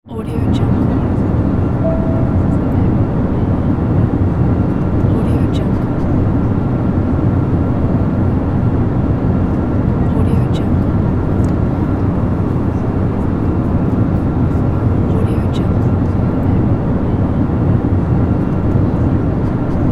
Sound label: airplane interior